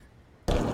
tun

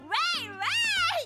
yaeeeeee

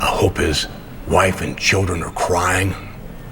crying wife

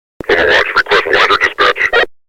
whirlwind

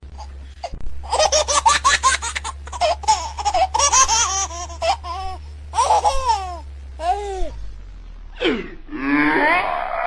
ahahaha

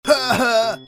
haha; blocky

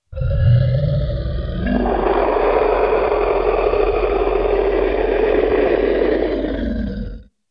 dragon roar

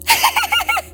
laugh

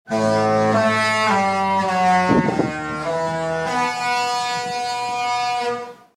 ship horn

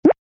start button windows 11

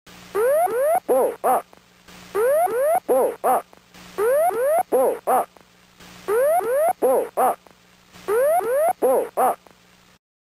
pull up boeing 727

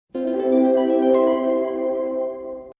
bee hatched

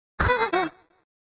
laughs

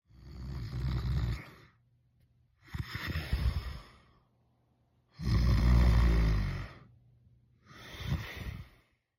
dragon snore

